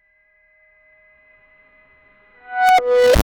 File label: a truck reverse beep